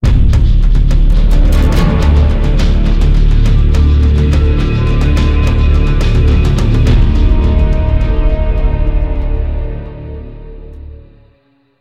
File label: spawn